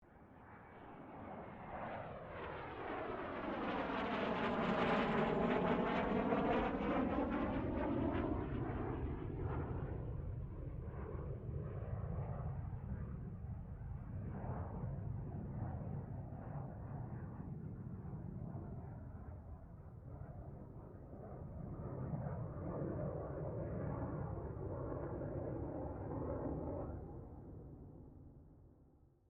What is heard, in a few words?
AIRCRAFT CA-27 SABRE PASS STEREO; CA-27/F-86 Sabre; Airplanes